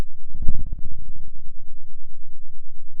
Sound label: pop, Sound of a dinosaur